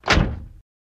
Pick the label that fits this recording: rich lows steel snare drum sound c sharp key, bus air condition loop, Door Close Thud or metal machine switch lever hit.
Door Close Thud